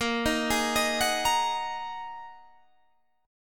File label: A sharp 7th Suspended 4th Sharp 5th down; A#7sus4#5; arpeggiated